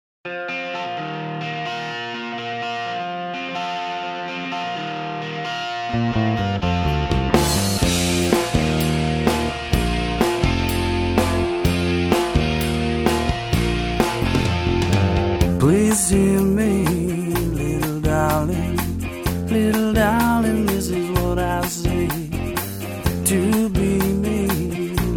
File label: Acoustic